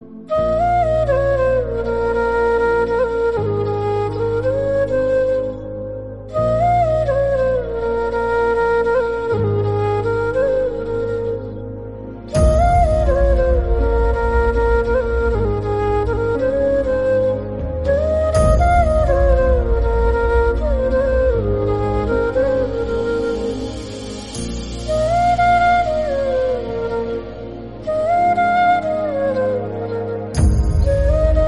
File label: mother sentiment bgm emotional; kgf 2